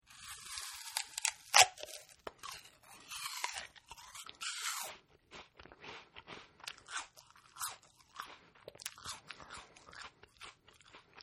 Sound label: Man Eating Apple, Man Eating A Fuji Apple, Crunchy Bites And Snaps; Could Be Use As A Loop; Human; Michael Huang